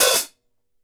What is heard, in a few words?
OPEN HAT, HI-HATS; Sampleheads; New York City Drumworks VOL-1; KD HI-HATS